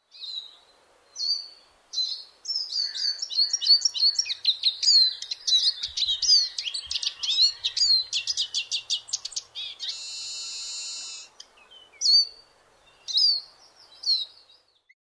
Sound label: siskin